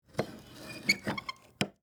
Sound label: Metal Hinge Close, Foley, Metal, Hinge, Close, Slow, Wood, Frame; Latches